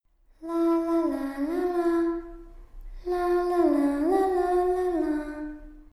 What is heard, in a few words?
Lalala; don t be afraid the first toy